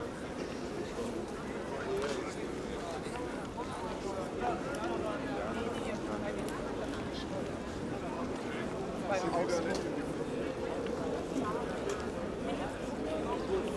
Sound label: crowd ambient; CrossCode; background